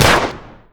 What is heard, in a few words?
luger shoot; tf2; lugermorph